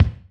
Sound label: kick, lofi hiphop; Legendary lofi hiphop